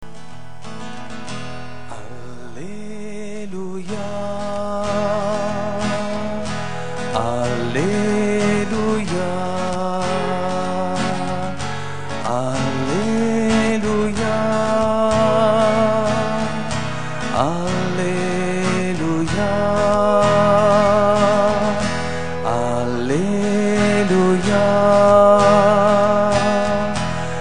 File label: aleluja